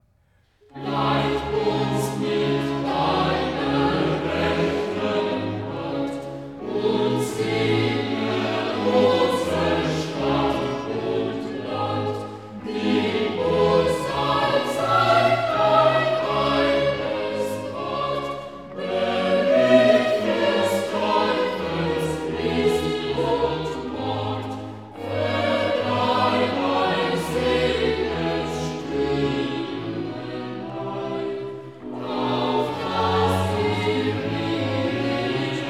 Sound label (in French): Choral; Leit uns mit deiner rechten Hand